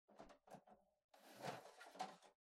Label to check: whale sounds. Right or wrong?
Wrong